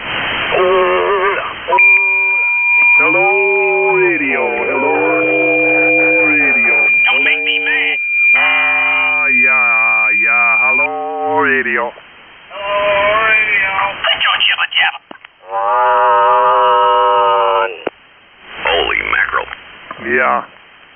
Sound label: SSB Tune Up